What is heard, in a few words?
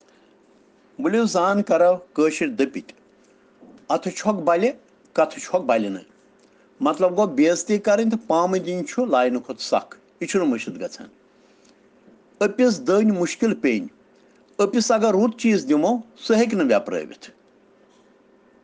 Children Rhyms in Kashmiri